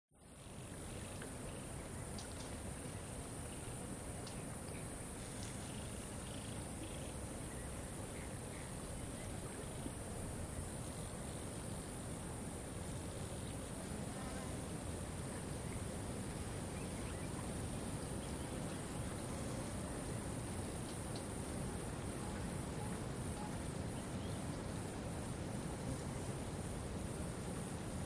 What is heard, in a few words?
BUSH River Day Idle; ATMO; smartsound